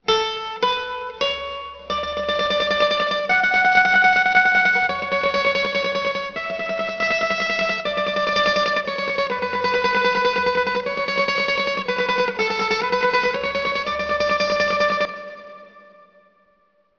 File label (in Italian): mandolino; Tono di notifica; per Cellulari Android o Iphone